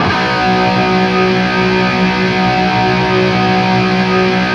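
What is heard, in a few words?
PWR B L CUT, guitar, power loop cut; East West; Steve Stevens Guitar Samples Collection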